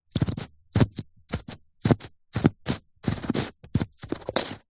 food drink bottle plastic sport squeeze water dribble nozzle